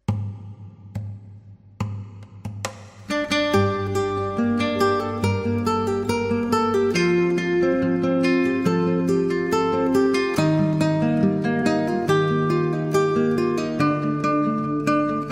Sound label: Guitar Instrumental